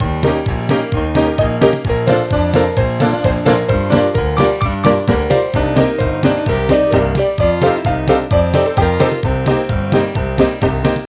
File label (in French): Belle